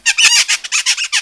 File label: damage; ancient mimic